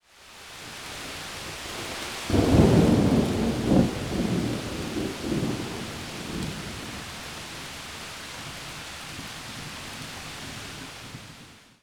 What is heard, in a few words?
rain with thunder